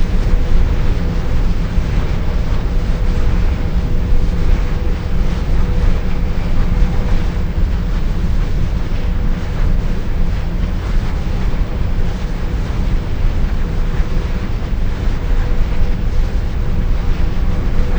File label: Wind Turbine recording